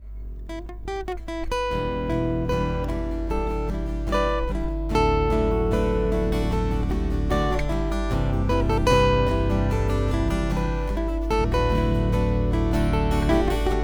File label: acoustic